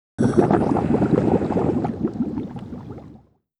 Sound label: player scuba bubbles; stereo; Call of Duty Modern Warfare